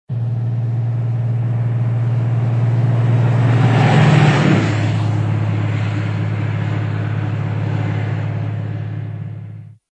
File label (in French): camion; nuisances sonores